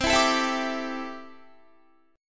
C 6th Add 9th C down Guitar; C6add9 strummed

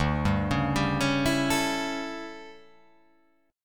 D Major 7th Suspended 2nd Suspended 4th D

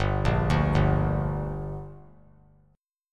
G Minor G down, chord; arpeggiated; JGuitar

